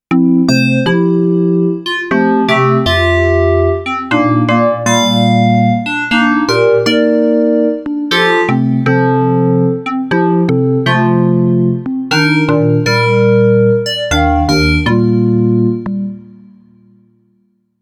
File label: KORG Odds Stochastic FM